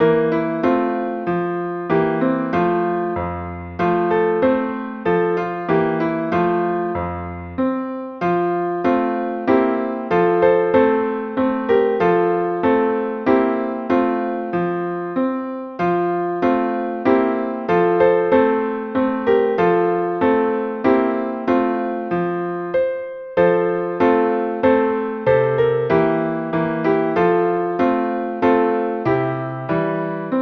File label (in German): Klavier